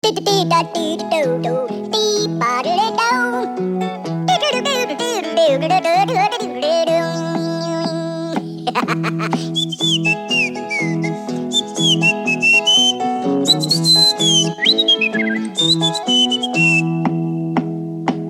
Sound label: whistle stop